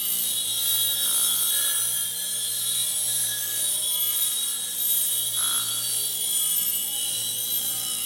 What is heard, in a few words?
PvP Pickup Loop; UI; Pickups; Warframe